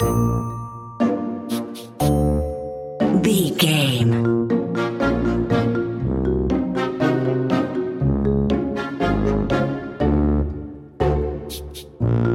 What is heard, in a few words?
sneaky tiptoeing